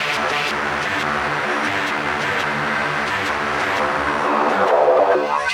Hutter Melody, Drum n Bass, Synth; KJ Sawka; Drum n Bass & Beyond

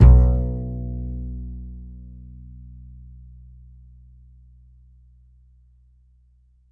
A, Upright Acoustic Bass; 1ST POSITION; Larry Seyer

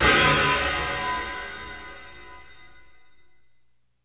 METAL STARS, LASERS STABS; ILIO; Trance Fusion

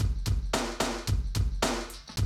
Classic DrumLoops, 110

